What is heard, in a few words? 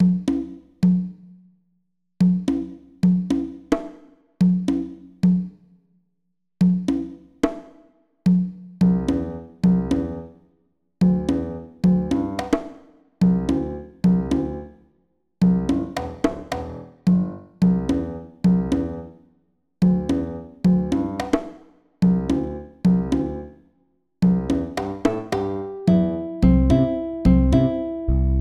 A loopable forest theme